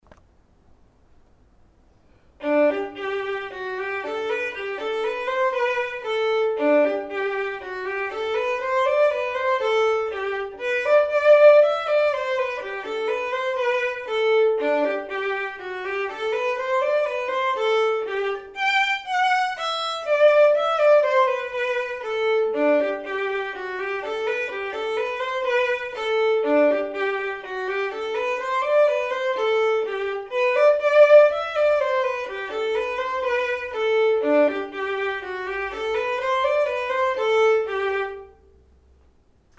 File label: perf vln